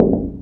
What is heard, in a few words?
glass sheet step; physics